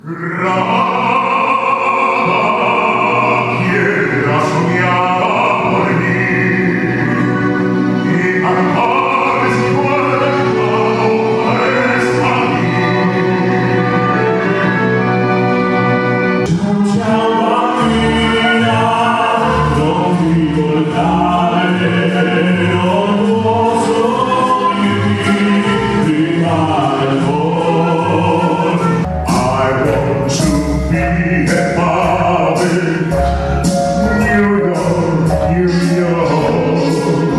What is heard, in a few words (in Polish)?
Vincero fragment koncertu